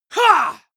male combat attack short

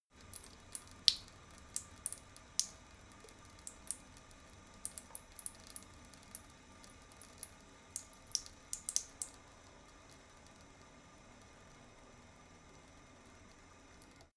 tap flow ultra light long, Badezimmer; haus garten

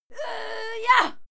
female fight scream yah struggle

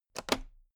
toolbox latch open regular

